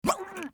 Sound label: scav wound; eft